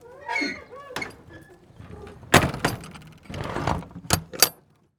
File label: Semi Trailer Door; Blastwave